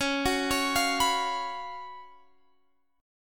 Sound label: F sharp Major 7th Suspended 4th C sharp